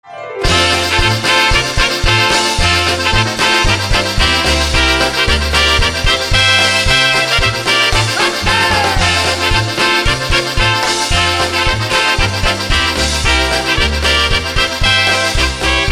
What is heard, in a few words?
Instrumental Karaoke Version